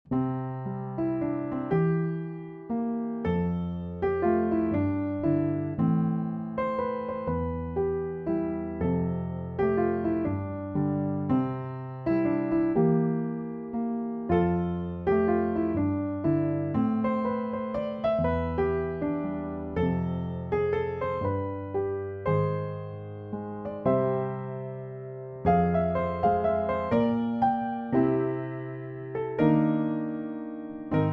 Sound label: Mazurka